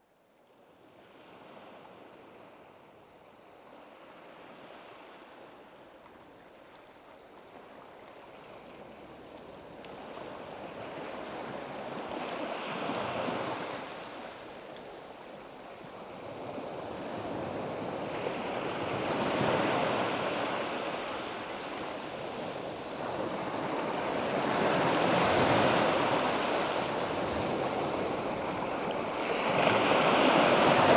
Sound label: ocean waves